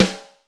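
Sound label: LIT SD, snare drum; LITE KITS; Crypton; DrumBase5000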